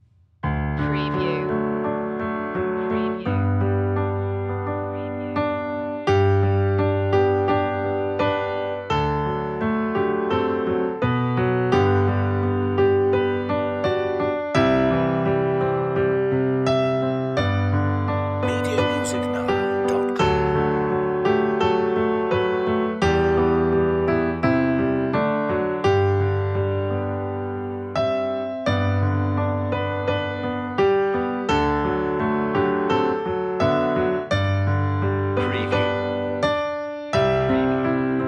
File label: auld lang syne intense piano 85bpm